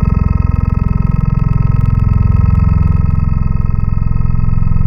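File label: Fuzzy, Synthwave wavetable; Synthetic's Serum Collection; BVKER - Synthwave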